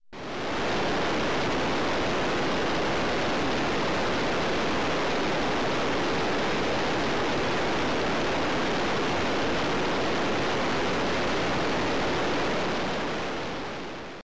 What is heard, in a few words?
Waterfall